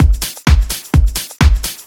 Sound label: Discohouse Loop 128 BPM